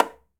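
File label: Tamborim Finger, latin percussion; Drums; Drum Hits; Tambourin; Ableton; Factory Packs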